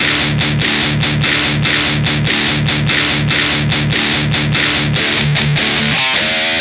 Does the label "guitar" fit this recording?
Yes